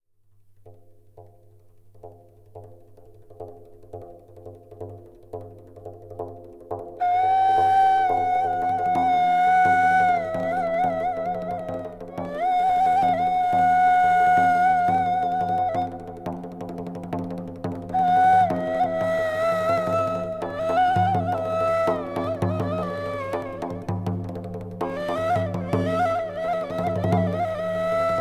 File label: Middle East - War - Desert Wind; Civilization V